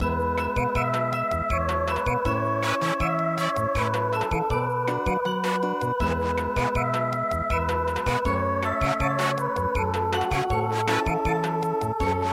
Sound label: Samba, midi; Scenic Mount Yimeng